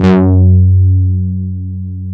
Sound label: DS8 F#2, Korg; Back In Time Records; Korg Universe VOL-1